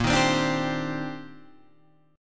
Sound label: C Minor 9th Bb, Guitar Standard; Cm9/Bb strummed; JGuitar